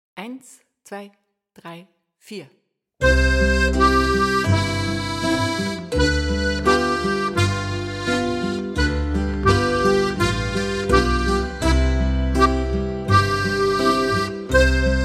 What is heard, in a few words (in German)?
Advent Boarischer